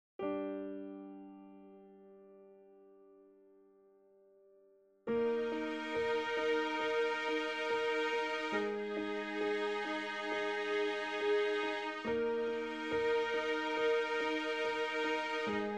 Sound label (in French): Piano - Cordes